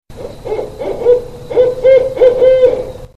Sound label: barred Owl